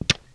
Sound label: Click Down